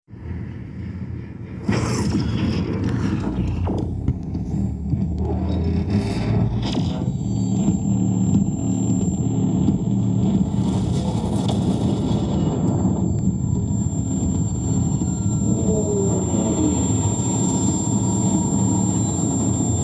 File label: EMP; Resurgence